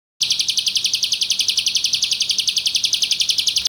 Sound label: Recording, bird; Pengusir Hama Burung Otomatis